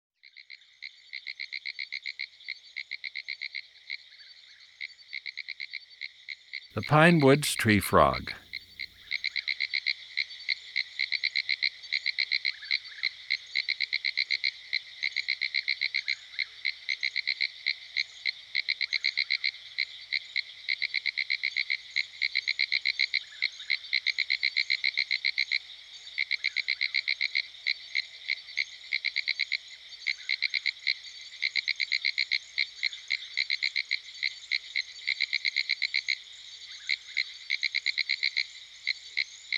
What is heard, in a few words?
pine woods tree frog; dan gibsons solitudes